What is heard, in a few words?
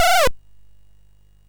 sid MOS6581R2; Gryzor; hvsc